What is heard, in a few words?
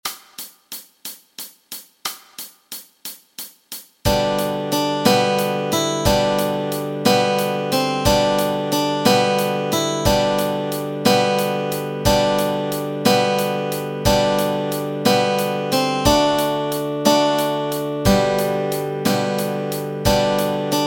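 melody and chords, Easy Guitar and Ukulele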